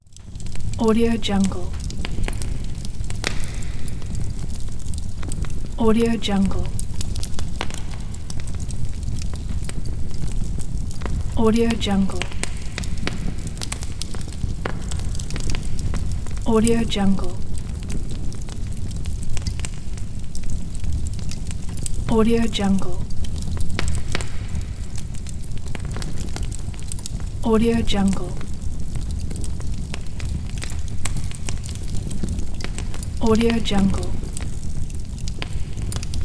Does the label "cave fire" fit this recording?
Yes